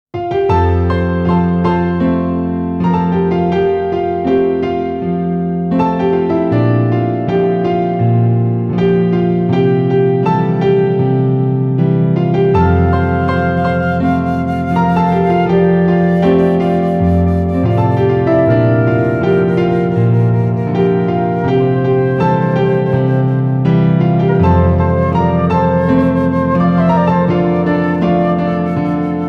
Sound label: Instrumental